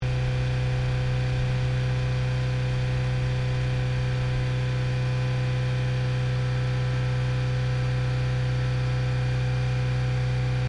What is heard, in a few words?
HYUNDAI ACCENT 3000RPM EXHAUST LOOP, car